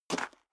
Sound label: step high heels gravel light single right; schritte; Footsteps; menschen